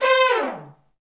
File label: Fat Section Falls, Brass Sections; Roland LCDP06